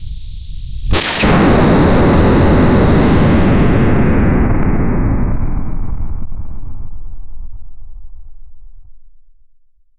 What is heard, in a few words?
sound of powerful neutron